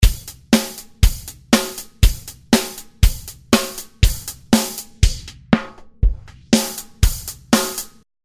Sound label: filter